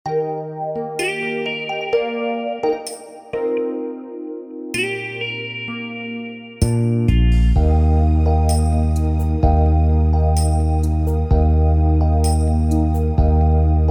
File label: Beat Ballade; wersi